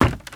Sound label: STEPS Wood Creaky Run; UltimateFishing2020